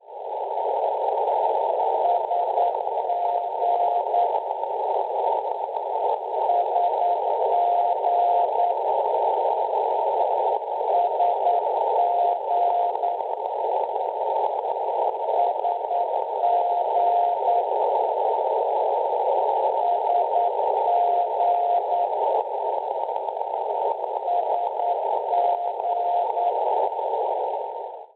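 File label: NDB non-directional beacon, 409 kHz; Satenas; Svezia; Schwarzsee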